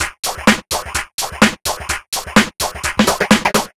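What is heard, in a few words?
No bass drum, Drum Loops 127bpm; Zero-G; Soundclash Nu Dance Electro; Acidized Wavs; Ugly Funk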